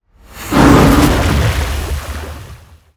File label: stream; Liquimon